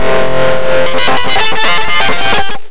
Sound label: dist loop